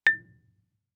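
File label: Marimba yarn fortissimo A4; MIS Pitches - 2014; Percussion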